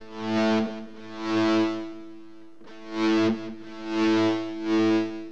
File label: Hip Hop, Guitar; LiveAct Pool